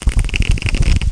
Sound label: Flip Book